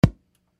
Speaker Pop Home Theatre System Speaker System Off; Entertainment System; Blastwave